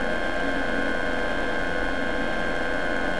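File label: ambience workbench; Star Wars Knights Of The Old Republic II; XBOX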